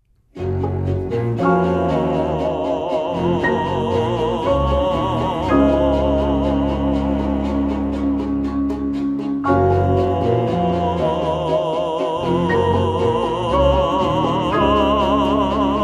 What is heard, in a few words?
Tone